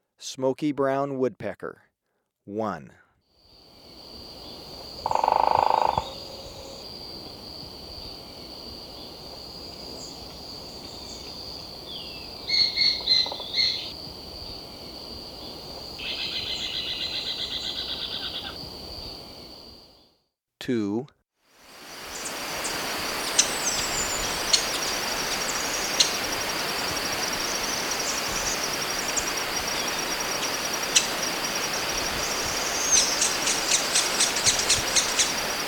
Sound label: Smoky-brown Woodpecker, Ecuador; S. America